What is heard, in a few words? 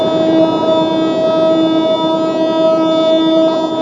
WEIRD BELL; Zero-G Ambient 1